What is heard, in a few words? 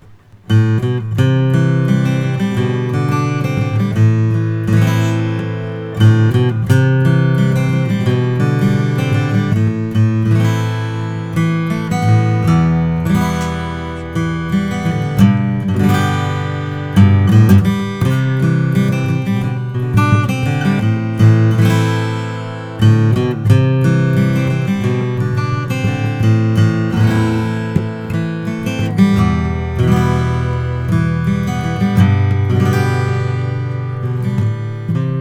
martin custom om 41 koa natural; aging